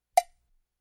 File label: Cowbell single hit